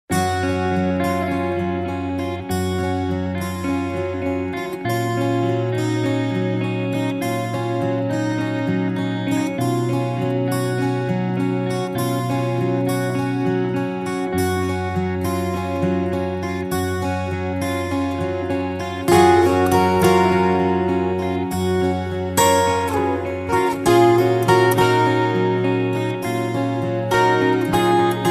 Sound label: Amazing Music